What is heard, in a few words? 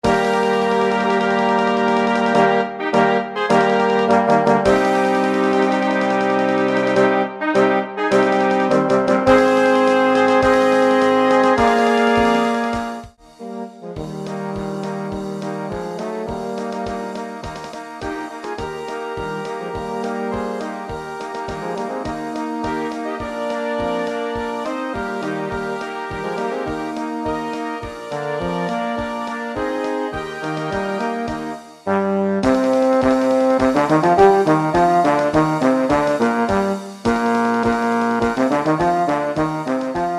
Polka; Lucni Polka